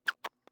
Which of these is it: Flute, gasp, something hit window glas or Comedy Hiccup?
Comedy Hiccup